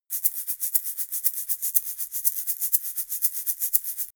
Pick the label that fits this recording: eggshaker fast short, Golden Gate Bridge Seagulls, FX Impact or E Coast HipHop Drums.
eggshaker fast short